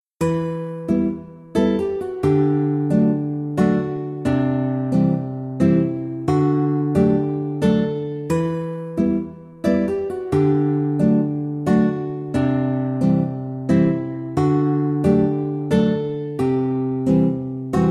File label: Tavern, Relaxing and Calm